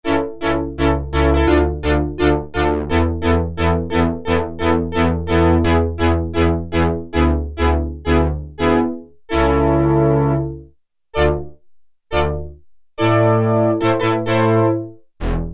Arco Ensemble, Prophet-5; Sequential Circuits Prophet-5 (Ver.3); Factory Patch; File 3 Programs

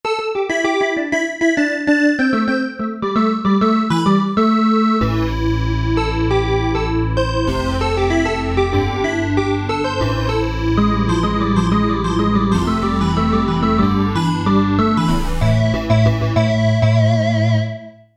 Mean Sync Ld

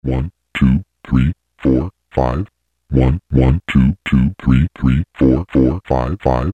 VOICE BOX, Yamaha PSR-300; Voices

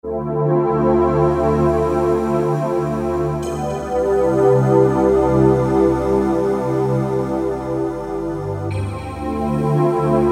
PADSWEEP, Synth Sounds, Korg DSS-1; ''D''-Synth Sounds

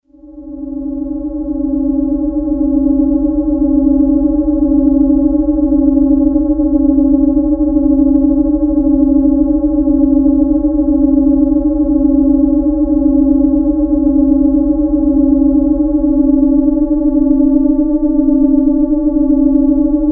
Alien, Prophet-5; Sequential Circuits Prophet-5 (Ver.3); Factory Patch

